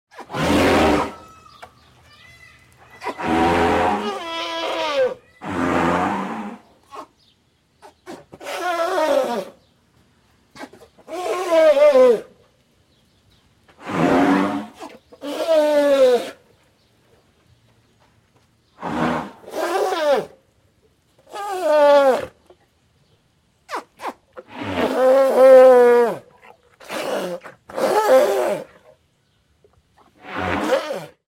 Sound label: Elephant Honks & Blows; Birds And Animals